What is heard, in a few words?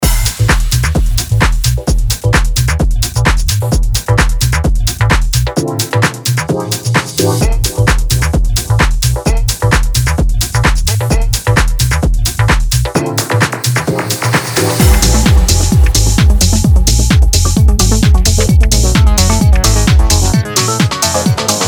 Minimal Deep Tech; Toolroom; Minimal Deep Tech Vol.5